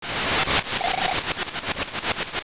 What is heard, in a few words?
Evil Eagle Skid; Evil Eagle skidding to a stop on the tower